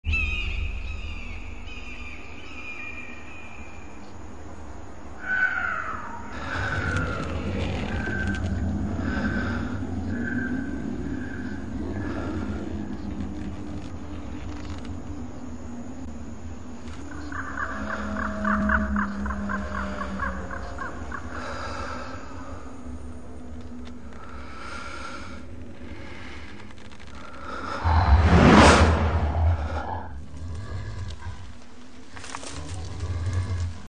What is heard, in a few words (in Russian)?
Skrip dyhanie nochnye pticy